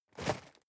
cardboard box medium shake single; objekte

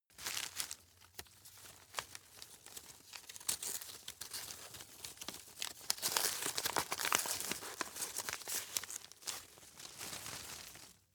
rustle sequence, foerster; berufe

